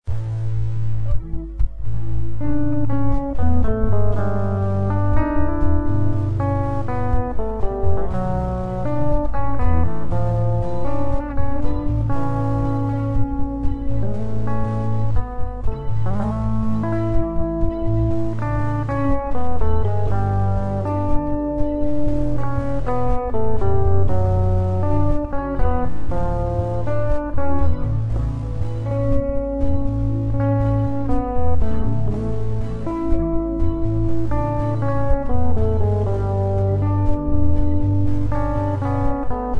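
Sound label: fantasy theme 3 A major acoustic; fantasy theme 2006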